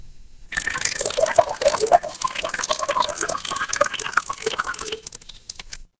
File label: water pour